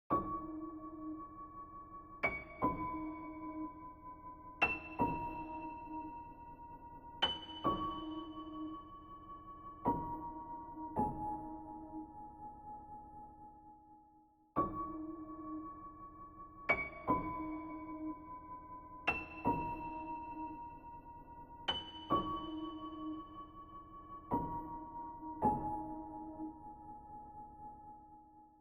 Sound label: Nature Sounds; Pony Music Archive